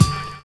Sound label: TRIANGL